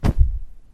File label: Poof Smoke